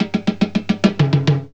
ROLL

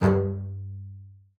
SBIC G2, BASS SPICC; Xsample VOL-5

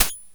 hh shake; opl3; M8

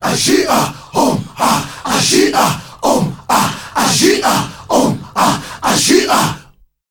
ASHIHA, World Voices, AFRO; ILIO; Vocal Planet VOL-5; AFRO NOISE

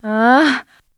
SEX; Zero G; Man Machine

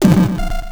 grind, EMU XL-7

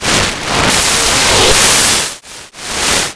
DISTO; USB Soundscan vol.33; AKAI; Disjointed Textures

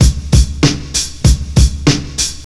DRMS, Underground Hip Hop

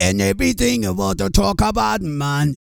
REGGAE, Vocal Planet World Voices

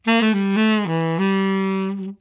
MELLOW, TENOR SAX

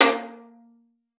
A#3, VIOLIN PIZZ; Xsample VOL-5; 90 s Sample CDs

